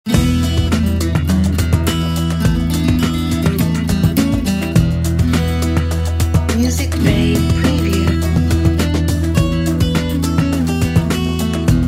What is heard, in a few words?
Tequila Blues